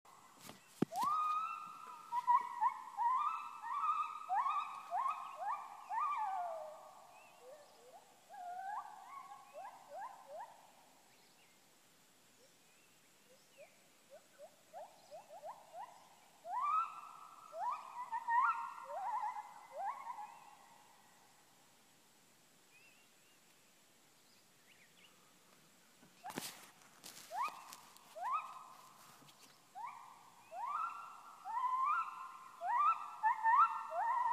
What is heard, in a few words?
gibbons call, khao yai national park